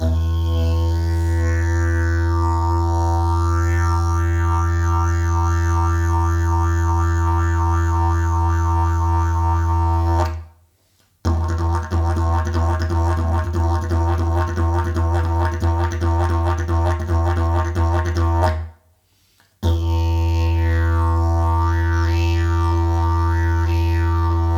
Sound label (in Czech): javor, didgeridoo; javor party